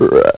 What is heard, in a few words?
frog; Take 2 v1.0; Animals